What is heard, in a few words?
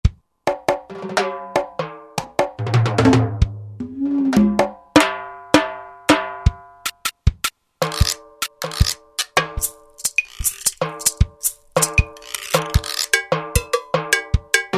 Cuban Perc.; World; Latin; Roland SR-JV80-18 World Collection Latin